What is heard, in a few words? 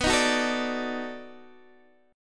B 7th Sharp 9th B down Guitar Open D